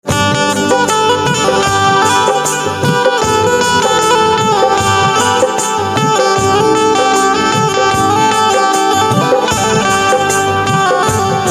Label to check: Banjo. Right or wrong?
Right